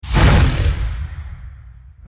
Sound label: miss